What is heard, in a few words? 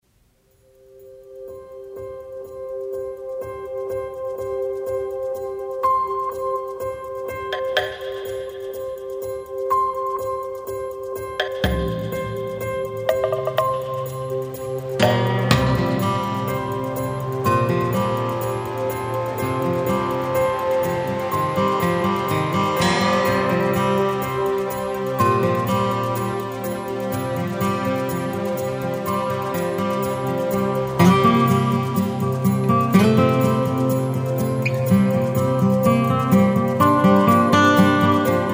ISLAND JAM